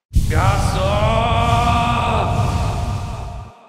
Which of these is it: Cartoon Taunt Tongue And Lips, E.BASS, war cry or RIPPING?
war cry